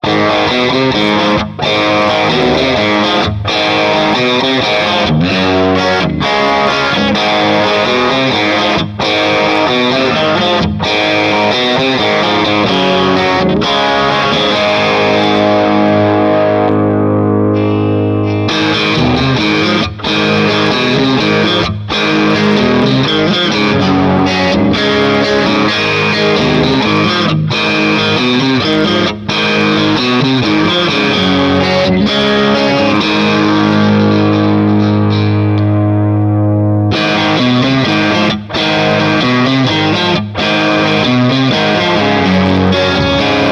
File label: crunch, asat classic nitro 3-ts